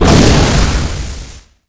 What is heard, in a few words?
elderdragon shoot; Dota 2; dragon knight